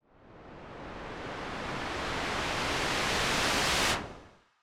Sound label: dazing flare; cardboard kaiju